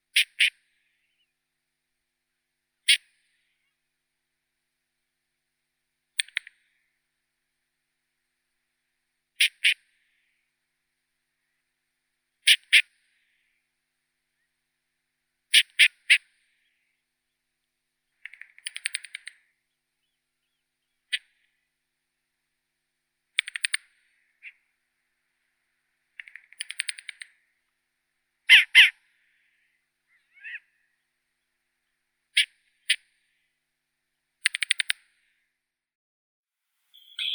zvuki prirodi sova bolotnaya asio flammeus sletok

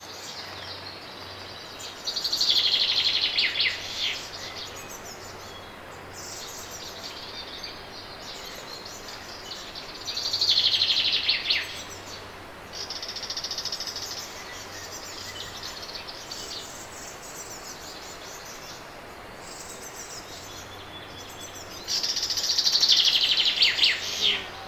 pesnya zyablika v vetrennii den

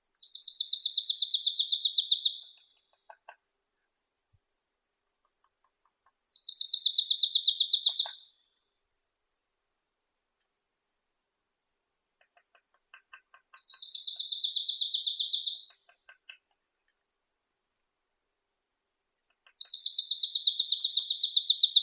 gaichka chernogolovaya poecile palustris

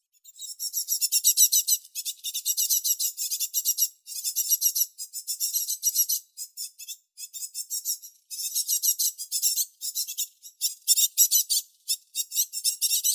sinitsa bolshaya parus major ptentsi, zvuki prirodi

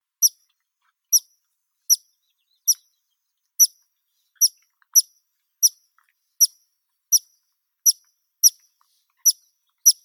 zvuki prirodi suslik krasnoshekii spermophilus erythrogenys

